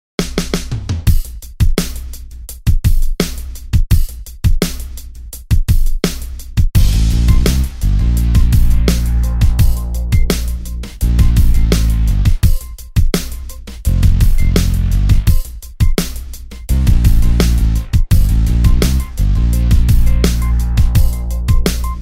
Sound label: INTRO TUNING